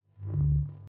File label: lightsword swing swipes; soundscrate